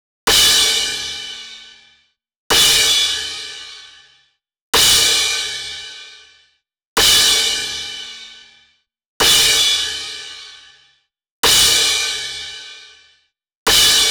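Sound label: drums cymbals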